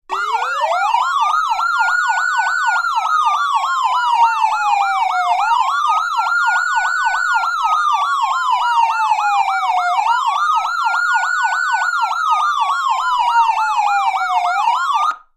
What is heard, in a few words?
wail and yelp, siren; sa441M